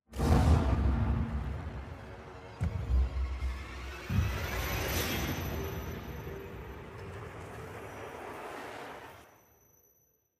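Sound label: surprise effect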